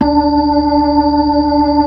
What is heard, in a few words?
B-3, Brite